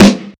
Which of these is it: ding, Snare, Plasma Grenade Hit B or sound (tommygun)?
Snare